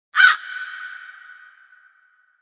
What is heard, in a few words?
Distant Crow squeak